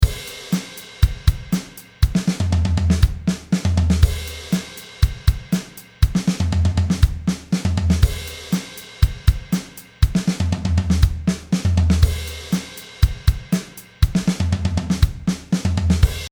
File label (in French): fill combiné AB